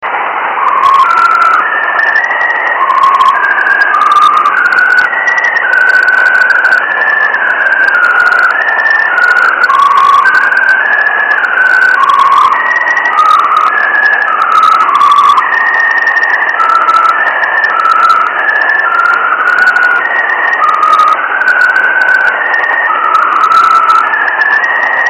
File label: lowspread QRA, EME; DL0SHF